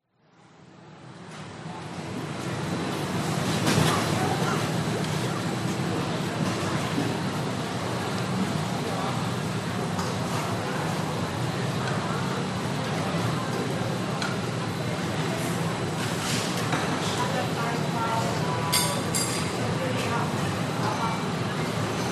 food court indoor chinese language people eating male food vendors shout singapore